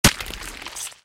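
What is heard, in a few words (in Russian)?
выстрел поразивший наповал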